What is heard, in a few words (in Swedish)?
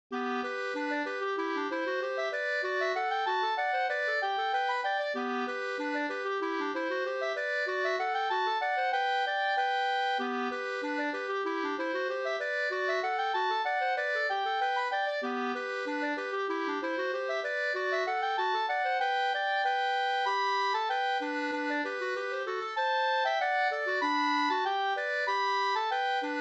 polka slunga; e Daniels Anders o CE Norman Da 2st